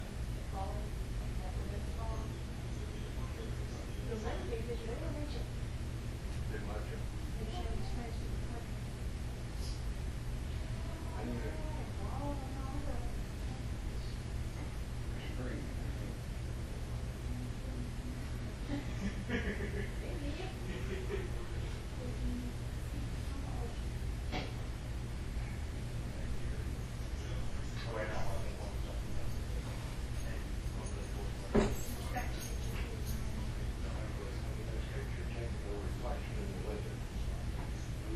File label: moan